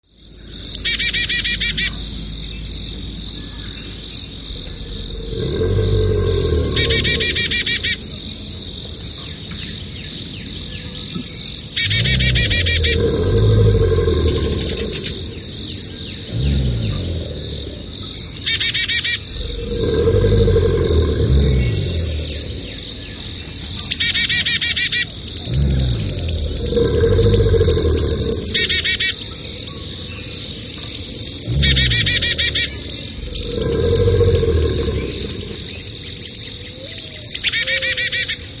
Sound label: american alligator